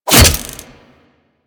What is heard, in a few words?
Throwing Taser Sticky Bomb Adhere; Warframe; Tenno